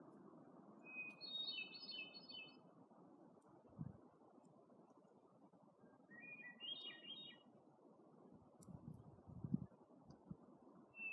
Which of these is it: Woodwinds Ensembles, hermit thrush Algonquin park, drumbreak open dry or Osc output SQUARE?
hermit thrush Algonquin park